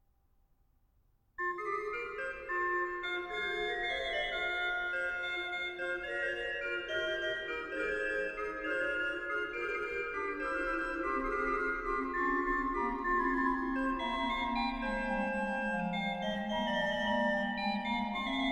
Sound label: Dandrieu Magnificat Duo, organ; ebersmunster